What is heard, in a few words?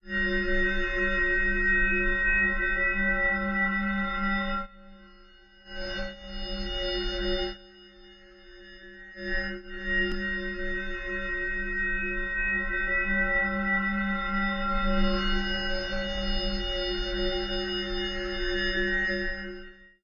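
flair lobby defeat loop; smite; god atlas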